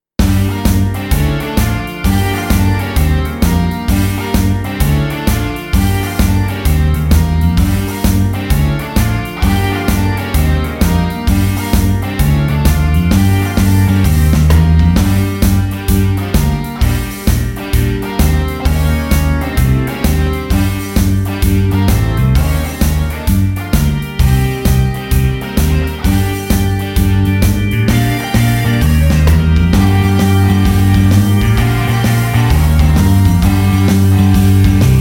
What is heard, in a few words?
Blues Rock in Gm Rock 130